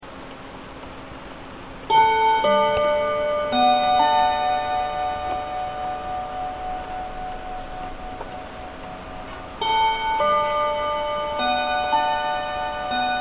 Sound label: avemaria fatima, clock chime tunes